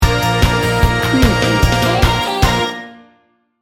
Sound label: driving upbeat rock sting bumper